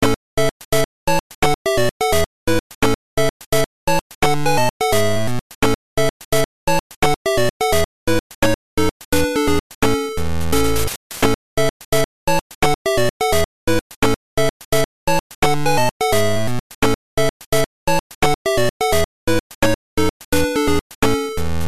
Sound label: Continue; Bomberman GB